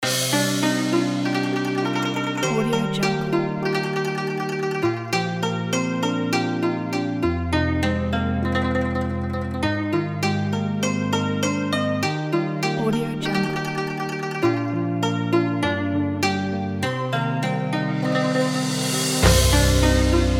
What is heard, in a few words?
asiatic dream